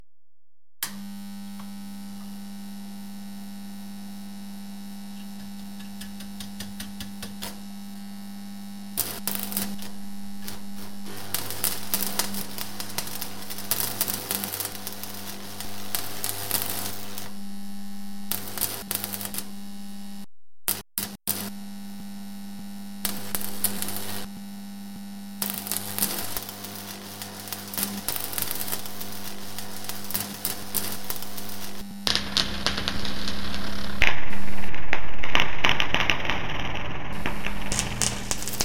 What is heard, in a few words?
welding birds